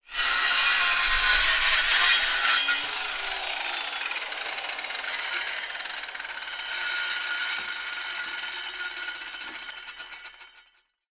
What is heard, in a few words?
industrial circular saw operate cut wood; zapsplat